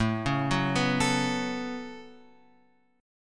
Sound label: A 6th Add 9th, Guitar, Open F; A6add9 arpeggiated